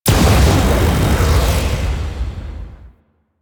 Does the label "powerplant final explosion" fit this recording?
Yes